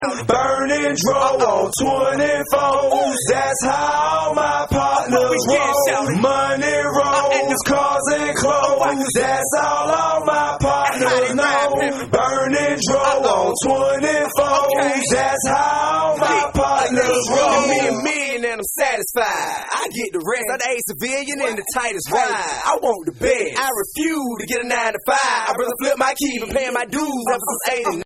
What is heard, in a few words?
Clean Acapella